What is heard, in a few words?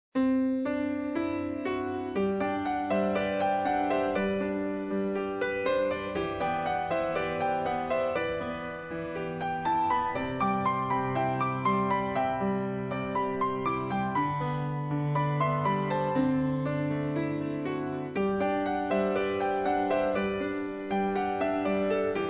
Alarm Sunny Background, 天气动态闹钟dynamic alarm; Xiaomi MIUI 12.5 China; 晴天Sunny; MIUI12.5系统音效全收录